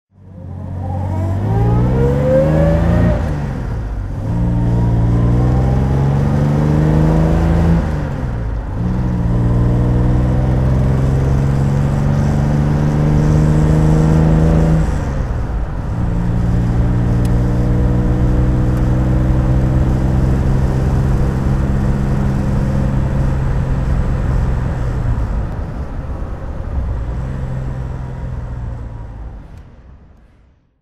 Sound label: jaguar xk150 3.8 S dhc driving; Jaguar XK 150 3.8 Litre -S- DHC, 1960; Engine sound driving; V3D/ ClassicarImages; Marc Vorgers